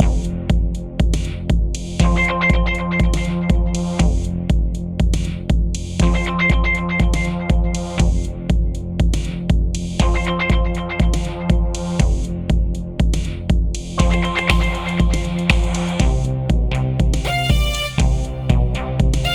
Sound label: Market Music; Warframe; Venus; Fortuna